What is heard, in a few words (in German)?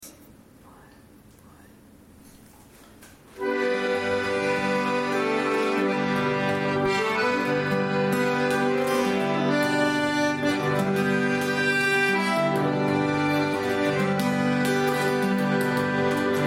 Heimatwein instrumental; Zeuterner